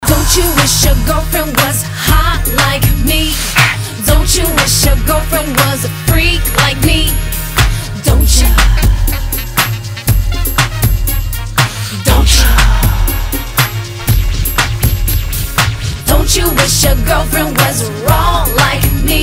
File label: music